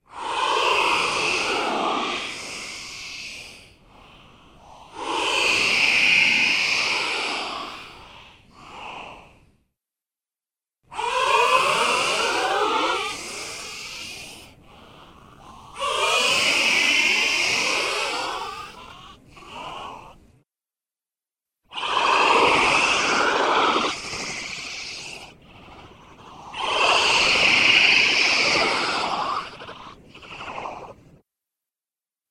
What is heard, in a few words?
Baby Cry wet; Crowd Chamber; Baby crying FX